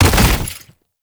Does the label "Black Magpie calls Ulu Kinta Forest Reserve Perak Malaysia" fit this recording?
No